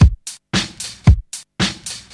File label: 113 bpm 00s drum beat c sharp key, kick tuned to the C# note